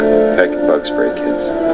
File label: bugspray